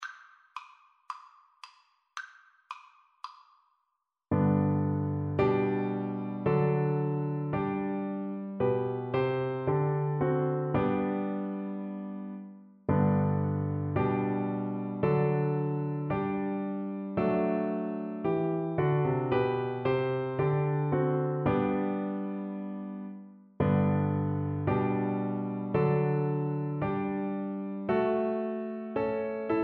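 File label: recorder karaoke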